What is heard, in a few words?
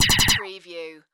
Laser Machine Gun; Sound Effects Bible